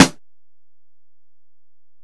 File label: Snare